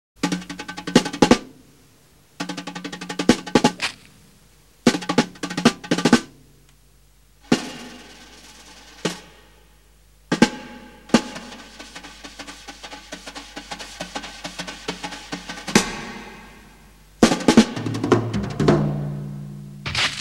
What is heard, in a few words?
RHYTHM SUSPENSE; Lupin III '71 ME Tracks